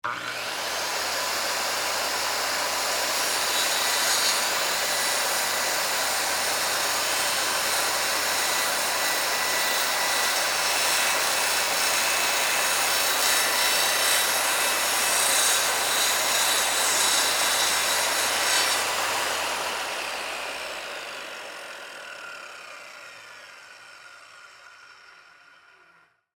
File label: Industry, Circular, Saw, Cut, 2X4, Wood, On, Run, Off; Saws